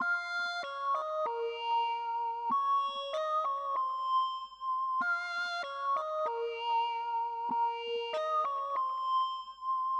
MELODICS, viral hiphop

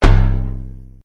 Steel, basketball; basketball-stars

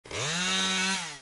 chainsaw engaged

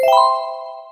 catch Pop Golden

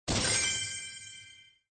quest done

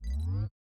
dice ball move

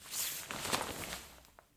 cloth Remove; spellbound

